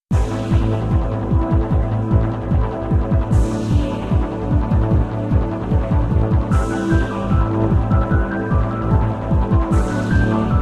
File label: Galaxy loop